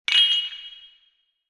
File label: UI comform sound